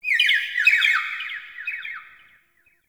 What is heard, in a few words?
Forest Open Glade Bird Med Two, Day; Ambience